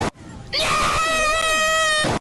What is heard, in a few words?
teddy bear screm